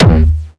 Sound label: Jump kick, Jump hardstyle kick